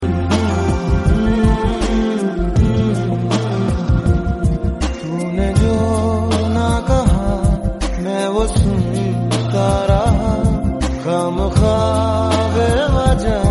bollywood song tone ringtone

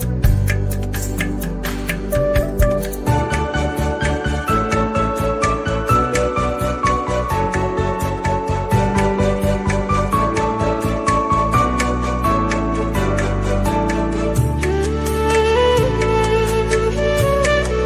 christian bgm

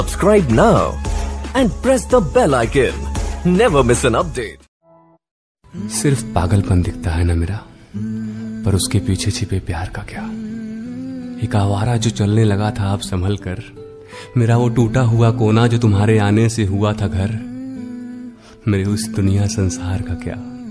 romantic ringtone

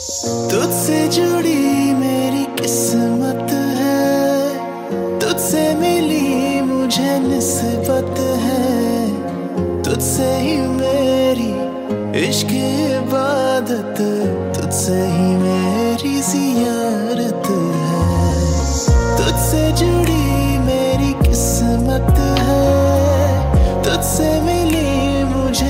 hindi ringtone

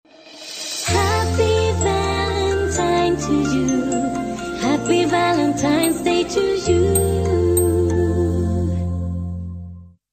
happy valentine ringtone

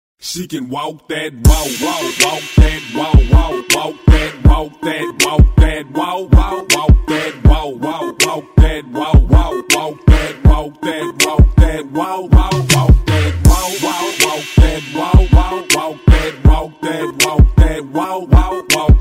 music ringtone

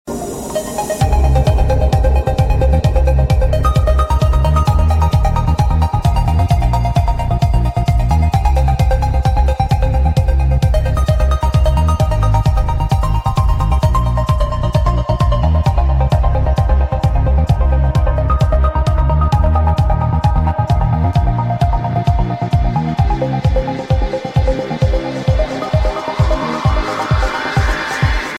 trance ringtone

